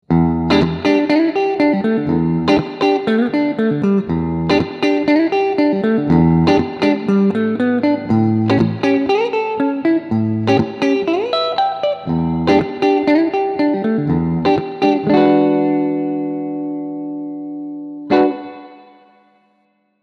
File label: ESP Phoenix 401 Neck Bridge clean